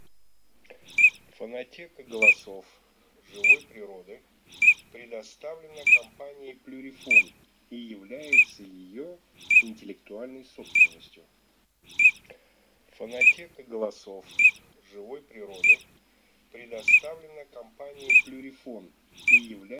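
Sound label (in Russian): Чирок-свистунок Alzavola, манок; plurifon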